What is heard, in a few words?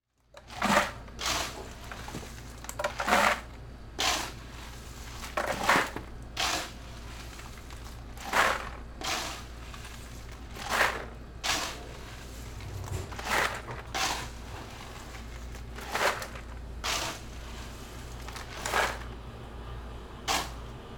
Household Ice Maker Kitchen Fill Ice Bucket